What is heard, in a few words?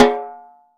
snare, LUDWIG SNARE